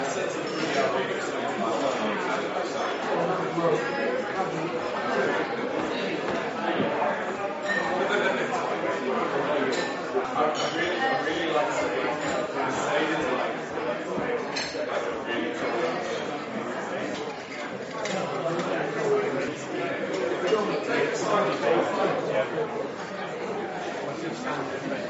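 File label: Ambience Pub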